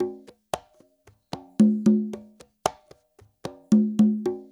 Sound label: LOOP, CONGAS, Percussion